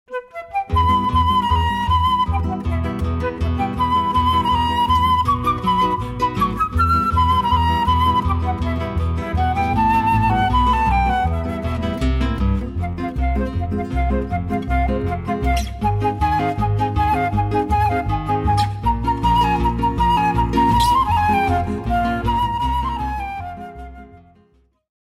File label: guitar flute bass trio; Simon Tov - Mazle Tov